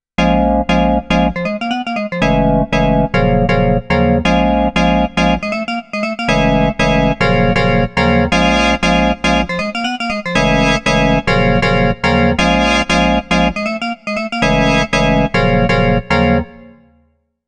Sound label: KORG Node; HammonDX